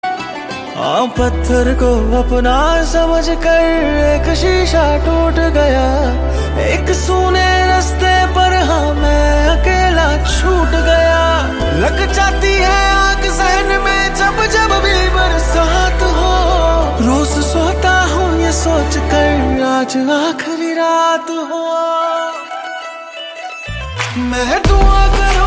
bollywood song tone ringtone

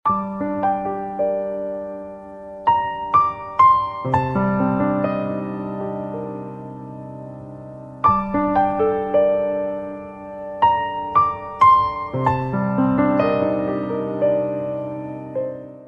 piano sad ringtone

